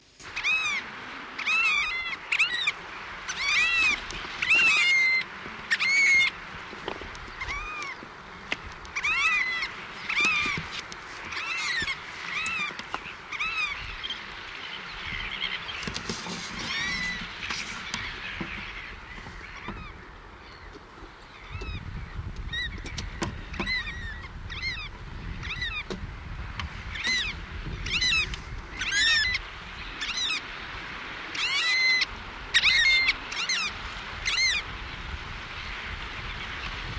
Variable Oystercatcher

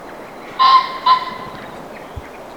Pheasant

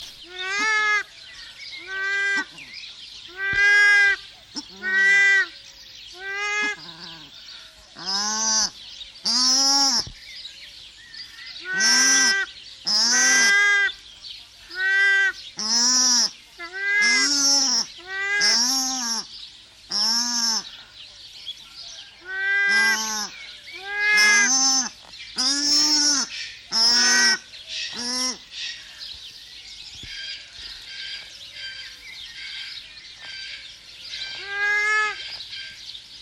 Paradise Shelduck

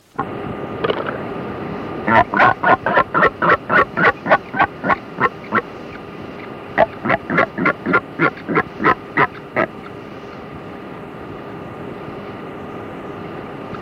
Southern Royal Albatross

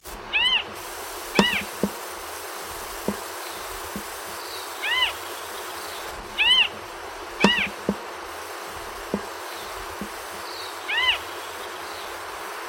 Fiji Harrier

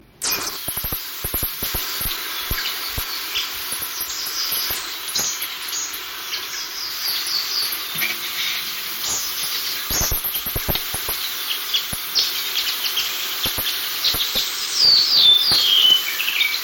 Rifleman (North Island)

